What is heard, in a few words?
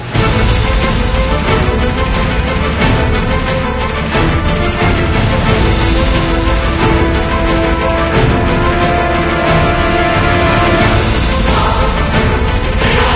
boss loop